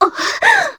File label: succubus hurt strong; queen girl